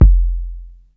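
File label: bass drum, kick; 300 Drum Machines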